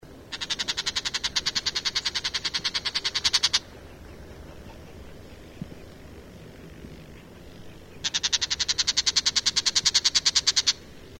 pie-grièche à tête rousse cri d'alarme

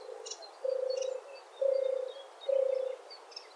tourterelle des bois chant

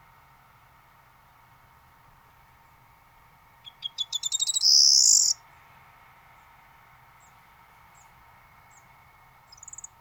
bruant proyer chant

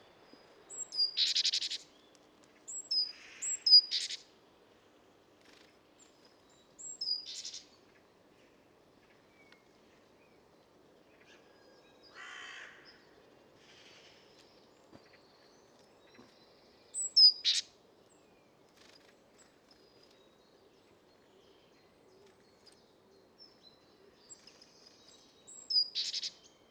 mésange charbonnière cri

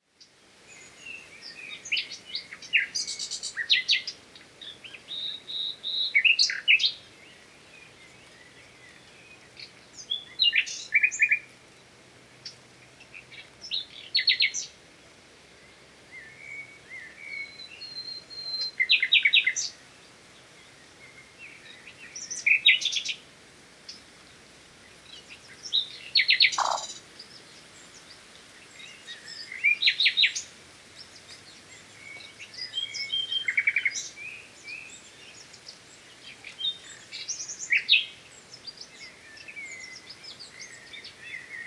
rossignol philomèle chant

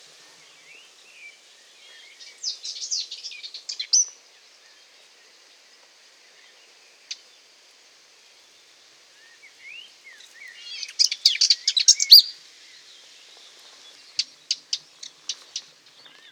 fauvette passerinette cri

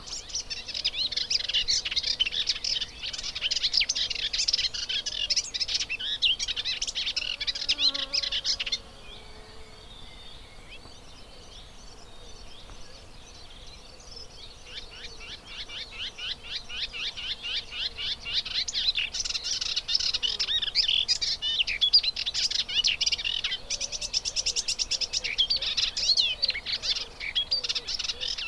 hypolaïs polyglotte imitation

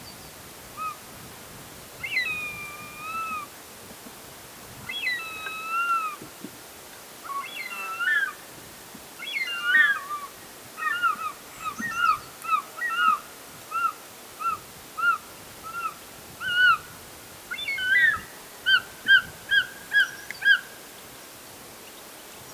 circaète jean le blanc cri circaetus gallicus

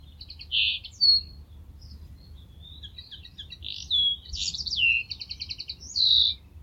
linotte mélodieuse chant

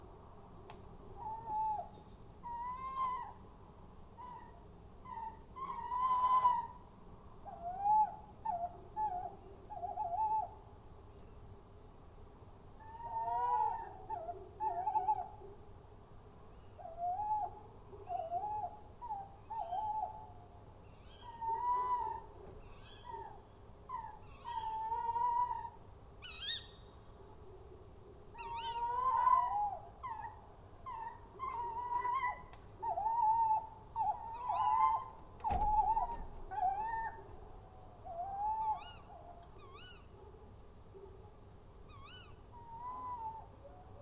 chouette hulotte chant cri

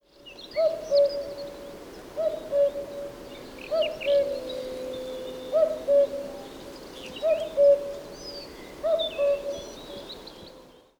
coucou gris mâle chant

